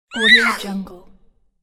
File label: cartoon pony neigh; fxprosound